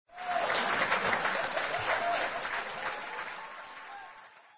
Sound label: combo; wanNingTaiQiu